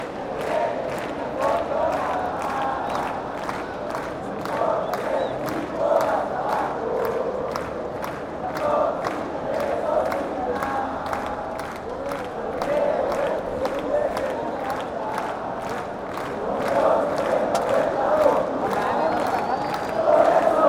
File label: bajo, chants